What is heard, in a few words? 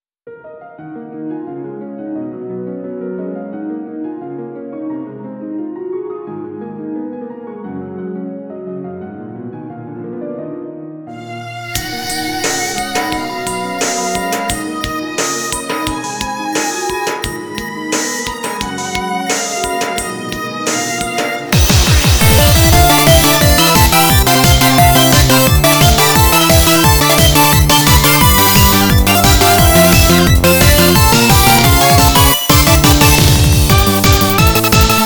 Off Vocal